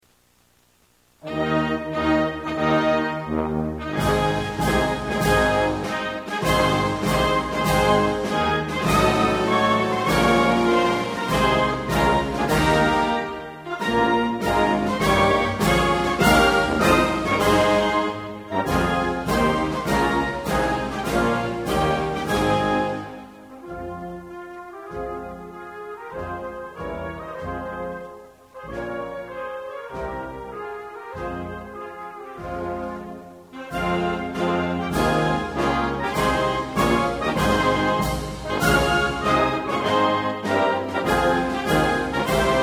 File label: Syrian Anthem